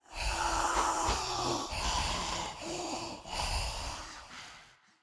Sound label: ghost trap idle, monster